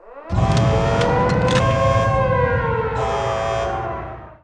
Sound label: start fx left; Pure Pinball